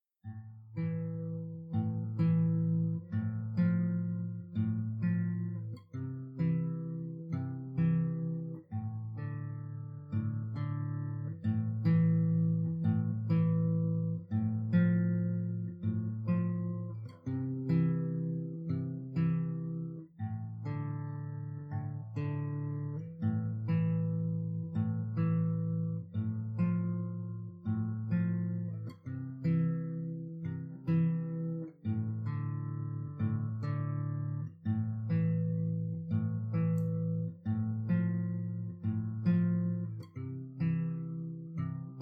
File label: ambiance